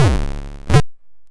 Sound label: YM Kick, DRUMS